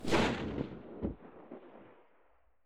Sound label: m4 rev; weapons